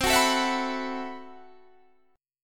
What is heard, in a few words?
A Minor 6th Add 9th C, Chord